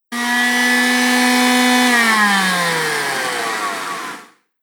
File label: Electric Sander Outside Ambience; Hand Tool Sound Effects; Foley Sound Effect; Andrew Fleming